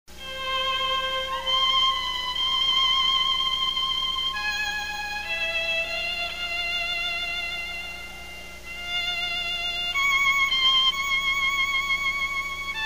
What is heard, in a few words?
violin